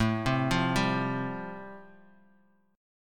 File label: A-Minor 7th Sharp 5th, Bass Standard, chord; Am7#5 arpeggiated; 17,15,15,12; JGuitar